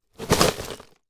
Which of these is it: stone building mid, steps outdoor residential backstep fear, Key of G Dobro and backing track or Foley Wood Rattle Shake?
Foley Wood Rattle Shake